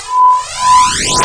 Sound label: Glass Hiss